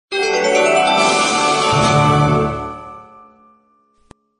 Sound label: win; twoniuniu